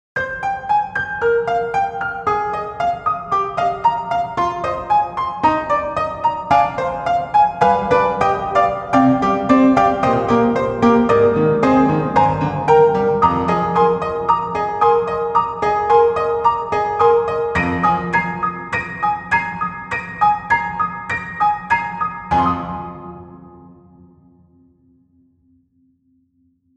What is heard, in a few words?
Stack Piano; VSTi; UNLIMITED HELLEST; Cinematica